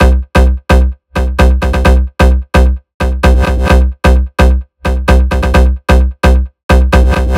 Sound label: Beat Loop; DF KIT